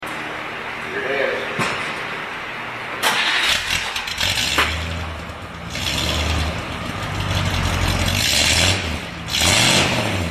Bel Air 283 20inch glasspacks, custom exhaust systems